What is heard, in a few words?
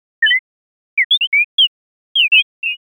chirp mix